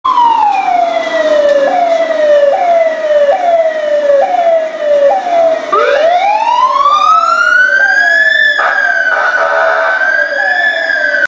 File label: firetruck; tiszafoldvar